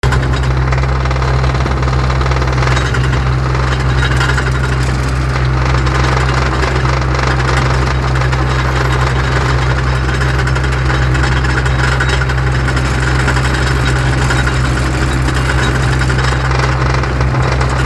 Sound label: mini digger; oakdown holiday park